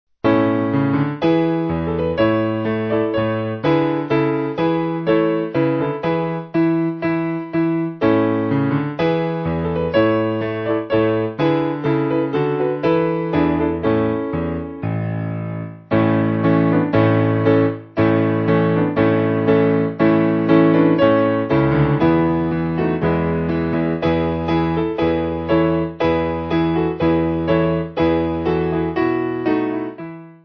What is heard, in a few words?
Trumpet Piano; Simple Piano